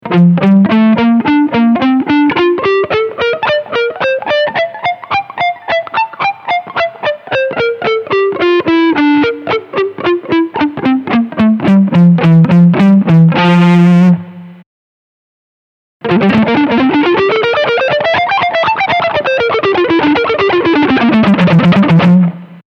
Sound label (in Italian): Fa Muting